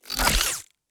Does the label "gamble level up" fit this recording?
No